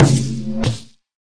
Processed Ethn Perc, ETHNIC and WORLD PERCUSSION

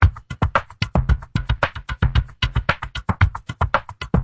113 wavedrum minimal, drum loops and breaks

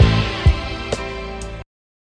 jam stab, sampled music; MELODIC SAMPLES

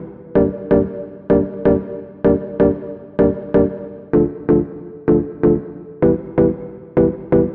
127 dutch sevenths, keys organ piano loops

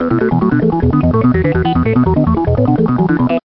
Long Organ Riff

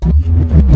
glass breaker bass; Basses

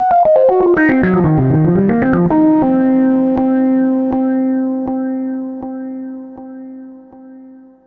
distorted epiano intro; KEYS ORGAN PIANO

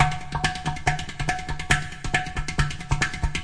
140 bpm, udu groove, drum loops and breaks

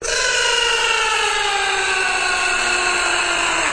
bdbdbdbdbdbdbdbda, MALE SINGING

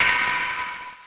lofi Sega sample; LO FI and 8 BIT KITS; Lofi Sega Samples

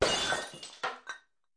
glass break

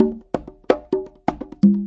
128 plain bongos, DRUM LOOPS and BREAKS

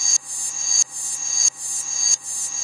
air and hammer loop, 091 bpm, drum loop; 091 to 100 bpm

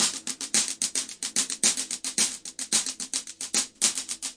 110 electric military walk, drum loops and breaks; 101 to 110 bpm

